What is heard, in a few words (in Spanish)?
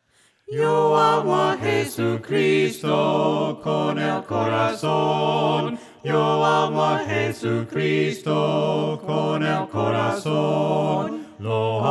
VOCES; YO AMO A JESUCRISTO